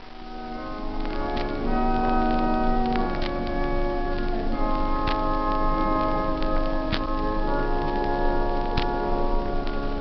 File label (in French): fonds du récit, puis fonds du clavier de grand-orgue; orgue cath oran